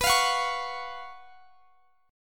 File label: Gb Major 7th Suspended 4th B, guitar